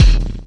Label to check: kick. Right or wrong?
Right